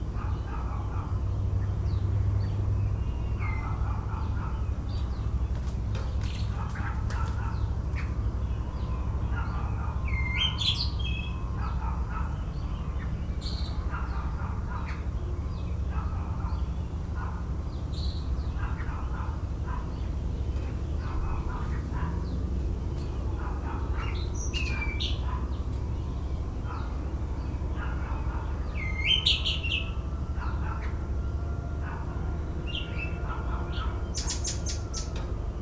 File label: Suburban morning dogs bird traffic siren; urban and suburban; boom library